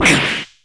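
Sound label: damage, Japanese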